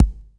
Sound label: Kicks Soft